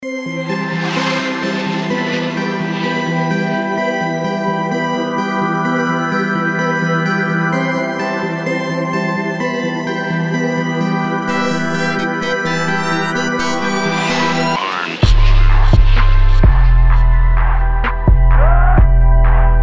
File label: Instrumental